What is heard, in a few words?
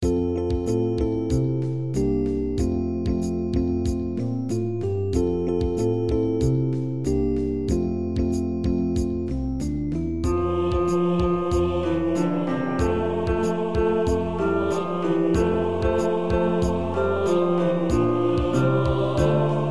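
sanctus baritone fast; paulusfest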